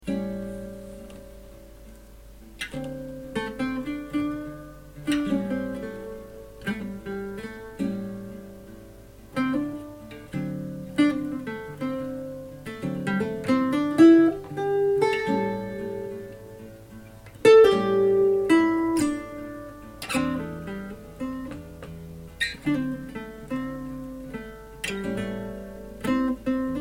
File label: Tele tritone solo, Guitares